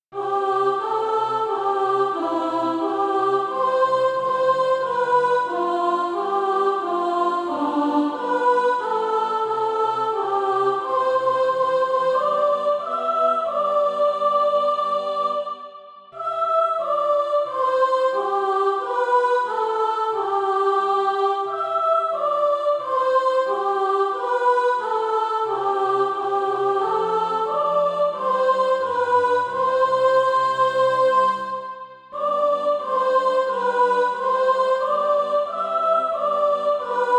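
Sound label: soprano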